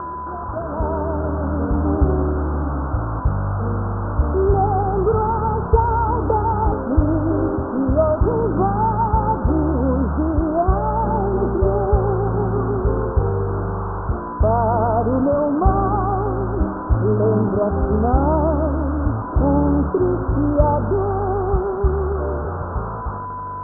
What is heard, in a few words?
pwm filtered; tube